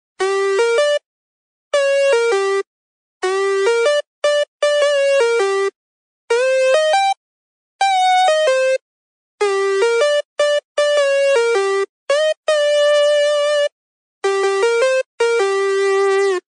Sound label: movie theme music tone ringtone; spiderman replica